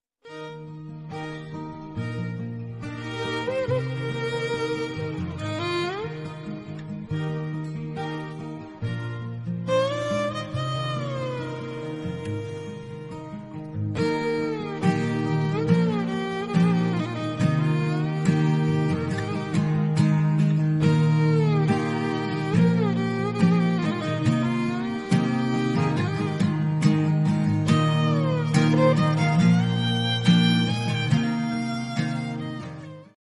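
song background music love background music tamil love background music ringtone; madras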